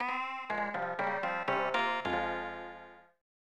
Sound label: Lose; Shodan Morita Shougi; SNES